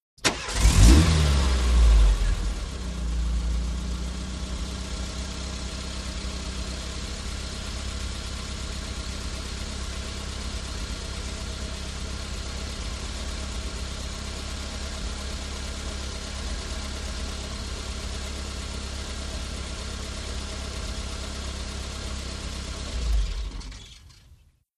VEHICLES ASTON MONTEGO: EXT: Start, idle, switch off.